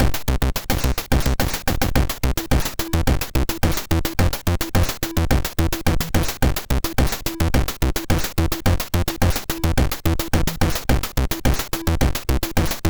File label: sid, 6581R3